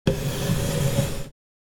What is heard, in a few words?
Dragging a chair on the floor scrapes and creaks